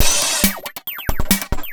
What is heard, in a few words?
percussion loops, Techno; PS-45